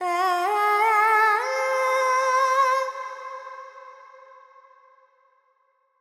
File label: FX Purvi Kalyani, Carnatic Female Alaps